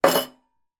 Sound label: household bowl with item of cutlery in down on table